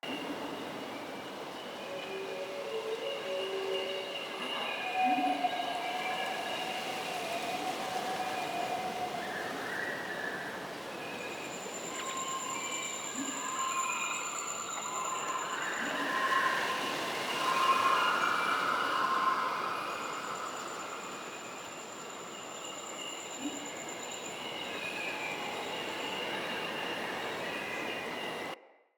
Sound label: Day Amb Loop, Ambience, Glades; Glades Of Andurin